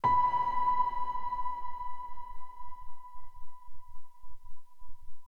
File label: Piano Reverb High Monotone